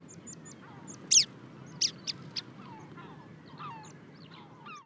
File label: both call types